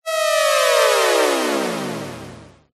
sound design descending high pitched digital tone

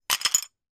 foley broken dish piece down on other; zapsplat

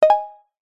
multimedia notification ui simple success tone

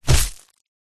impacts body fall hit ground stones dirt

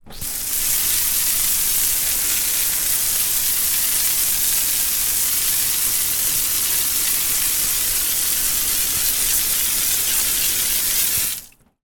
household hose spray onto hard ground

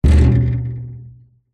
impacts metal trailer side hit knock

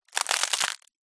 palm branch dead dry snap break; foley

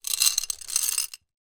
leisure marbles many in glass container movement; zapsplat

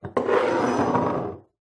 pub bottle slide counter; field reports

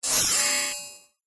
science fiction machine door open

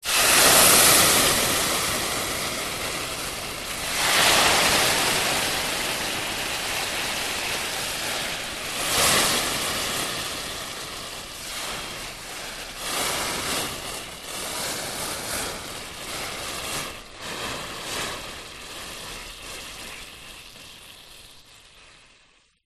household water pour pan sizzle burn swirl around

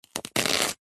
celery raw cut knife single; food

